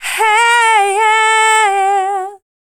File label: FEMALE, Dance Vocals